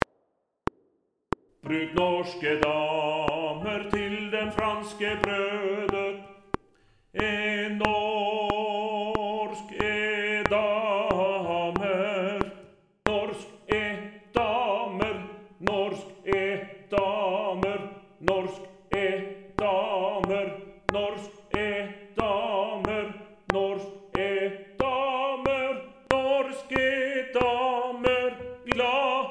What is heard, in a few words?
Tenor 2